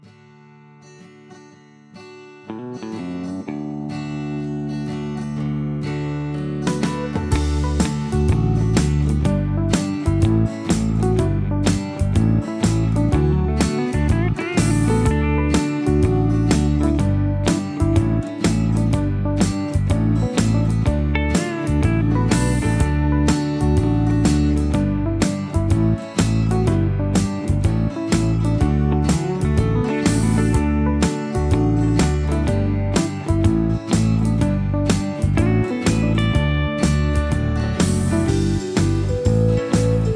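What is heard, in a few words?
Instrumental with background vocals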